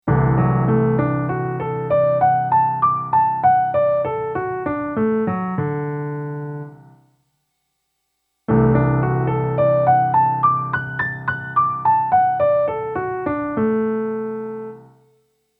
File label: D Major arpeggio Inversions Sustained Chord